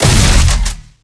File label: Shotgun Fire; Guntech Tormentor